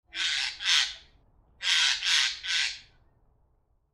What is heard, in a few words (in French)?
geai de chene cri